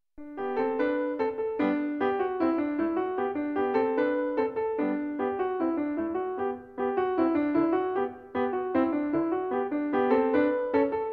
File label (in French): piano